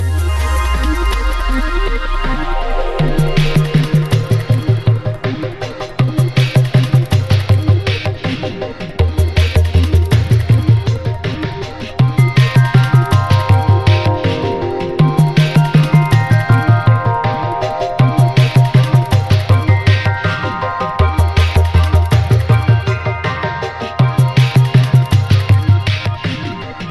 Marathon Lose, Tetris; mobile tetris

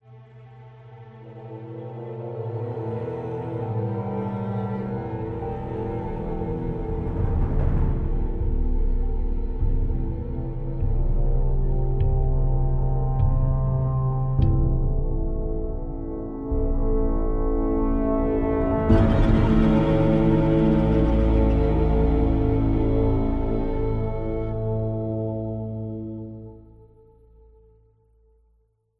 Suspense

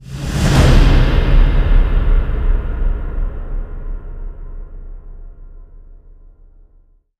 combat; backstab; android

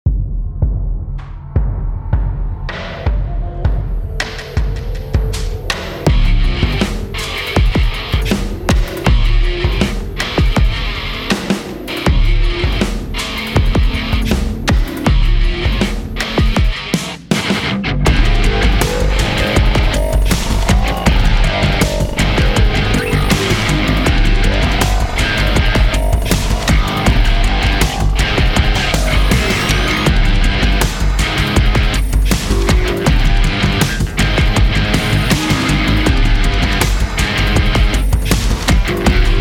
Boss Fight; Dust Neon; Sharp Shot

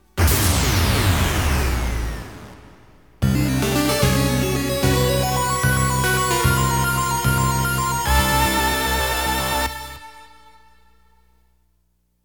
DISAPPEARANCE, Game Over; Space Manbow

